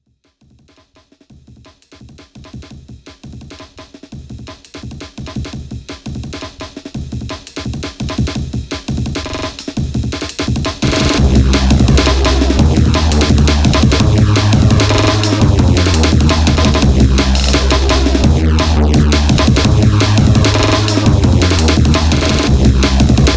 DnB Glitchy; OpenBundle; Background Music and Loops

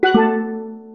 jingles STEEL; Kenney's Sound Pack; Music Jingles

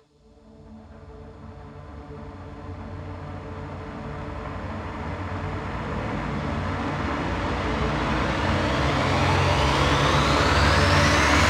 RISER, Cinematic Sound Effects; 99Sounds; Generdyn

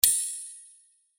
Sword Sound; FXHome; Fighting Sounds

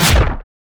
Single Shot Full Tail, 8-Bit Retro Game SFX; David Dumais Audio

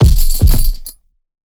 Punching Perc, Bag Drop; PROCESSED; 99Sounds; Punching Percussion

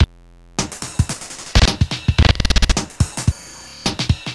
Loop, 110 bpm, Stutter Sounds; 99Sounds; Mangling Audio

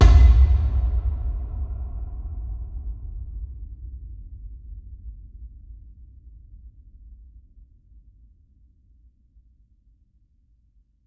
Low Thump, Cinematic Impacts; 99Sounds; Rumore

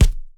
Punching Percussion, Straight Glove, raw; 99Sounds

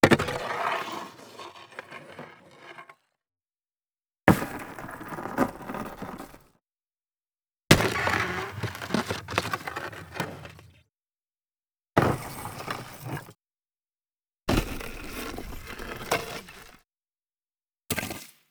ice blocks movement dragging; Mindful Audio; Elements - Ice

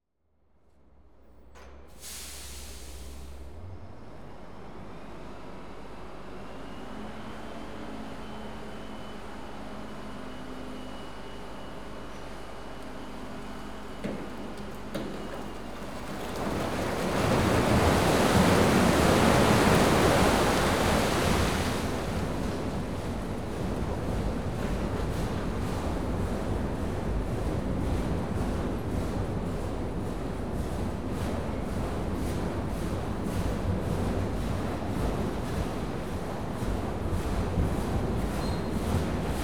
Quarrying, Rock Crusher, Lorry; BBC Sound Effects Library; Explosions Guns Alarms

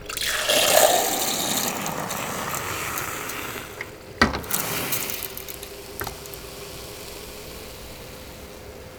Drink Pour Sparkler, Pub Foley; Glitchedtones

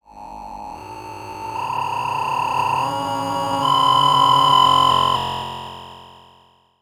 Textures; 99Sounds; The Weird Side Samples

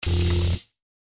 Saber; FXHome

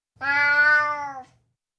Burmese, Individual Meows, Cats; BBC Sound Effects Library

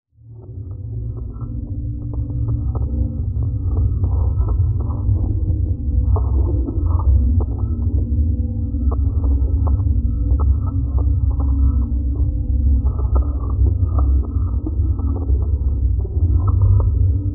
ambience, Abandoned Labs Dark; Bluezone; Abandoned Labs Dark Cinematic Samples

